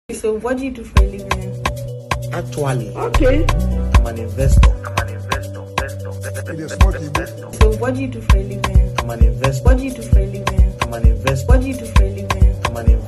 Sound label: Beat